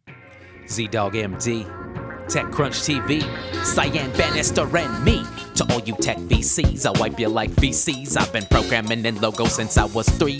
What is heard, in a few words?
Rap; Techcrunch